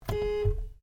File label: Horn Long Interior, car; Hyundai Accent 2008; Hyundai hire car; Vehicles